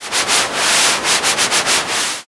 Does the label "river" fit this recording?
Yes